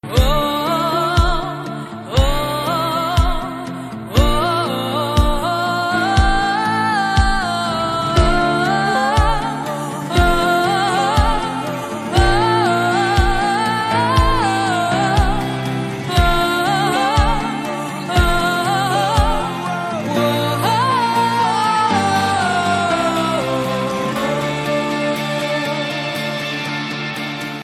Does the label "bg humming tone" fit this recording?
Yes